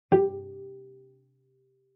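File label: Cello pizz fortissimo sul D G4; MIS Pitches - 2014; Strings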